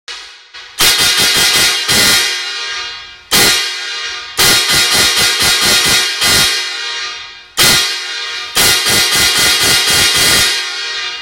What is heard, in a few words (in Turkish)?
Dörtlü Metal